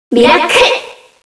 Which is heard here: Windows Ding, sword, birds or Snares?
sword